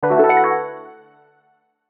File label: Musical Accent, Musical Accent Keys; Musical Accent Keys Strings Fast; Keyboard Sound Effects